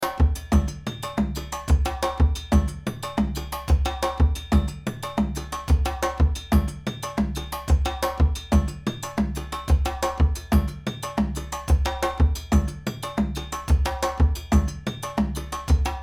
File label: kene foli drum ensemble loop 120bpm